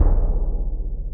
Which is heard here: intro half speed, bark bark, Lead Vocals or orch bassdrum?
orch bassdrum